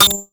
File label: Multimedia Internet CD-Rom Flash Beep Electronic